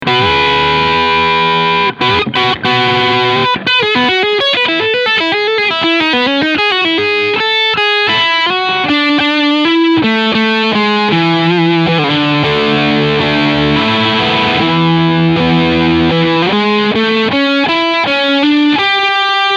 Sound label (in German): Harley Benton BM-75 Leadsound Steg PU; Trans Red